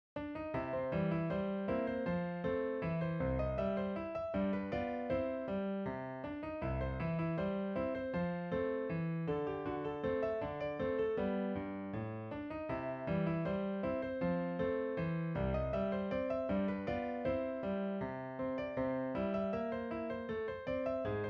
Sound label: Entertainer; capella audio2score